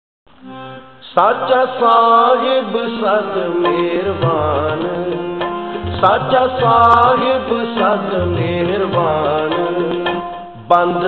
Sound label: Gurbani Ringtone